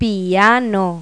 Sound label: PIANO; Pipo-Aprende musica con Pipo